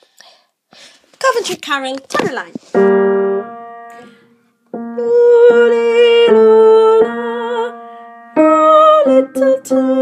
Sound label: Tenor; Coventry Carol